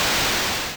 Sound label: Quiet Sailing; Trade-Winds